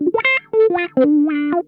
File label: SKIN DEEP, Funk Guitar; 90 s Sample CDs; Zero G